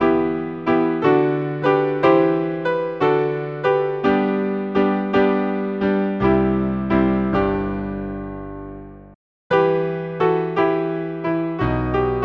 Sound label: Hymn, piano; We three kings of Orient are